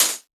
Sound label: hat, drum sample; Hats